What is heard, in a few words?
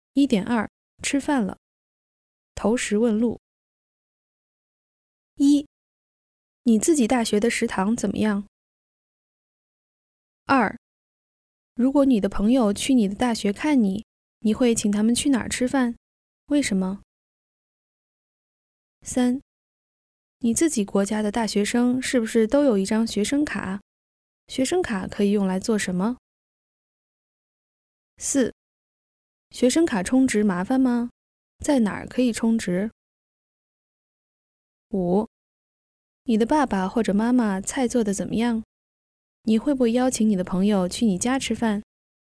Warm up